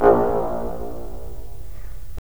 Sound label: BRS, Roland LA Composer